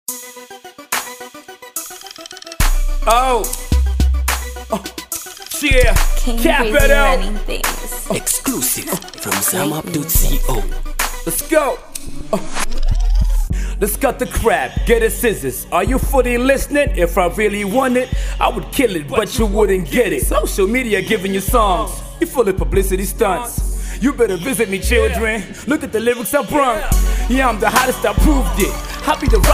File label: jump off FreeStyle